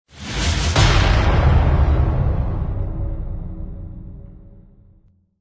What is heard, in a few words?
Cinematic Impact Logo